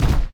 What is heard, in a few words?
Building Placed; virtual city windows gamerip 2009